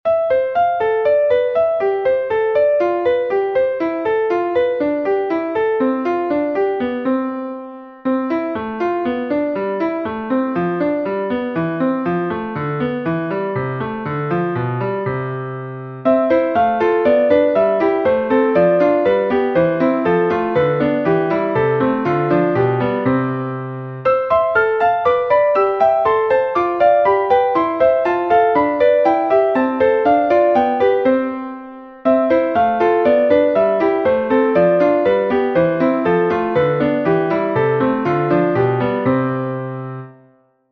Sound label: Piano Warm-Ups, 6's and 3rds